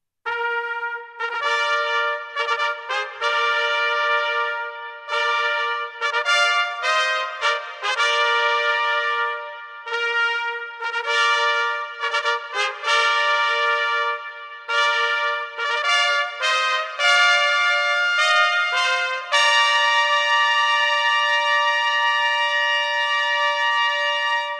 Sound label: fanfare ceremonial; Fanfare for Two Trumpets